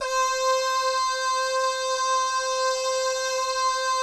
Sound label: "bah" guy, Vocal Jazz Fx